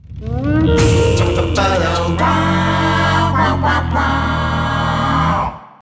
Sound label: Goal Fanfare; crash bandicoot series sound box; Fossil Fuel Injection; 3rd Place